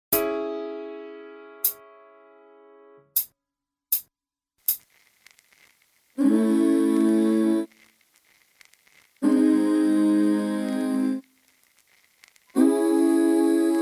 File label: Karaoke